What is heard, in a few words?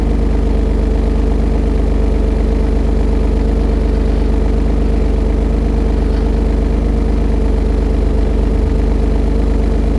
alfaromeo 164